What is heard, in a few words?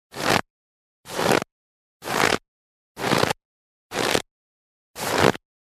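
shagi moroza sneg, footsteps frost snow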